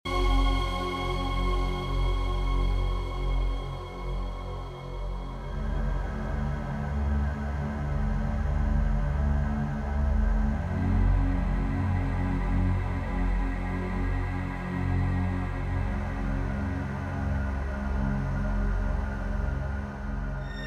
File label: One Synth Loop; Dune 3